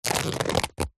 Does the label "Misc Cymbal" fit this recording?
No